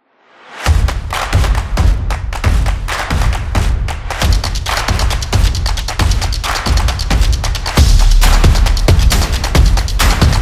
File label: Photo Opener